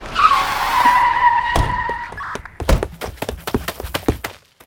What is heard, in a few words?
police approach